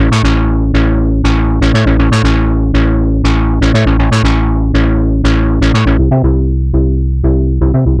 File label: novation peak noise bass; novation peak test